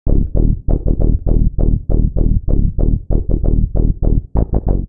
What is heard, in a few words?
Eb Minor 86bpm, Bassline; MIDI Clips; Freak-o-saur; Ableton; Factory Packs; Chop and Swing